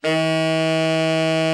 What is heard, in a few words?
TENOR, Sax; VEL-SW; Giga Samples Collection